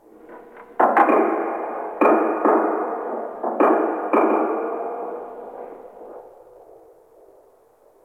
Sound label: Ocean Underwater Creaks Medium; Warframe; Grineer Ocean